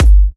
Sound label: G, Kicks; markus hakala